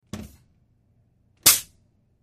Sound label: Oxygen Valve Button, air